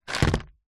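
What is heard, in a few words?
household medicine vitamin c tablets plastic tub set down wood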